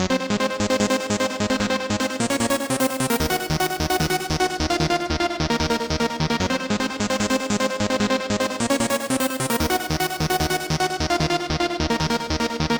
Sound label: Trance Express; acid techno trance vol2